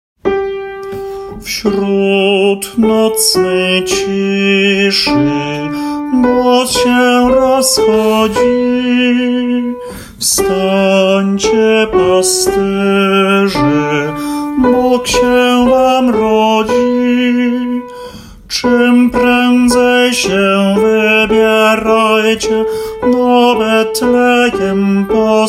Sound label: TENOR